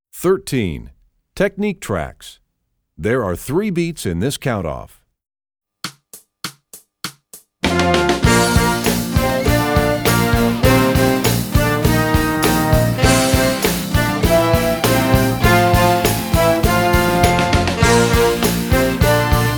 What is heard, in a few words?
Technique Trax, trombone; essential elements